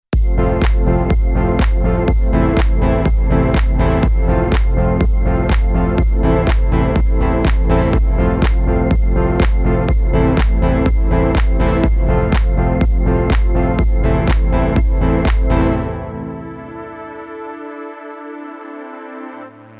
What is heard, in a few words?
Deep House